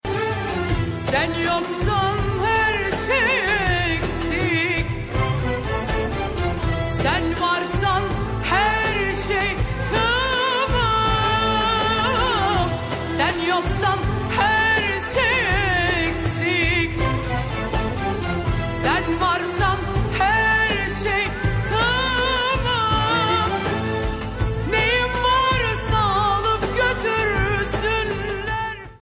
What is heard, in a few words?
turkish